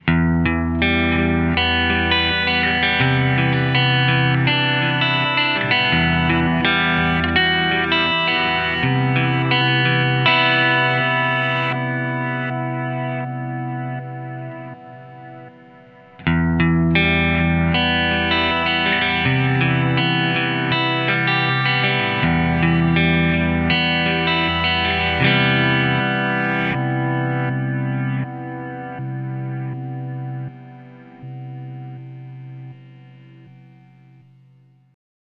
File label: reverse; DAM TIMELINE